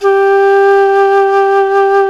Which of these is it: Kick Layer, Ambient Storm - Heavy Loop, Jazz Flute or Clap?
Jazz Flute